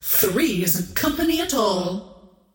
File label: scream; queenofpain